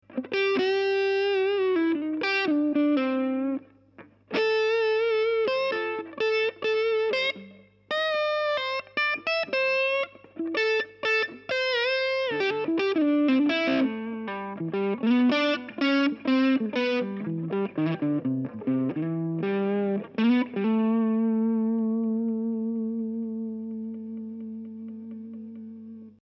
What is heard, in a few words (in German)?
hals angezerrt knaggs